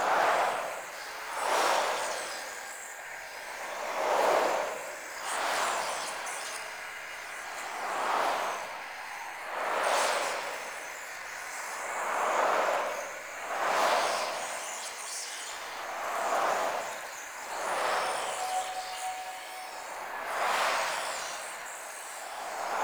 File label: Move Loop; Dante; Warframe